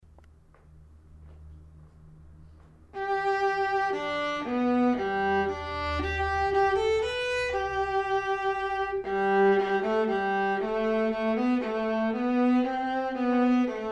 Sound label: Menuet Vla; melbay; Handel